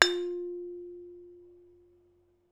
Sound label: large plate hard hammer; bellplates